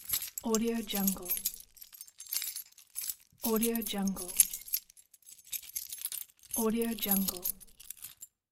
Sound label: bunch of keys